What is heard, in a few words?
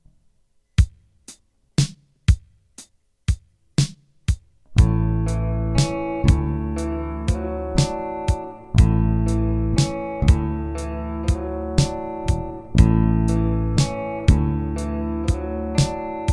A D Chord Melody, guitar